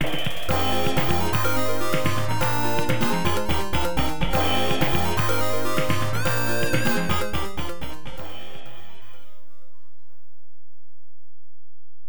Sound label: Round Clear; Spirit of Fire; Round Clear 2